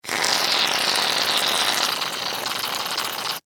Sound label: Household Mousse Spray Hair Product; Primping (Sound Effects:Human)